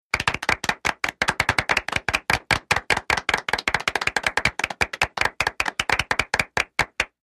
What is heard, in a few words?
Night Club Rhythmic C, party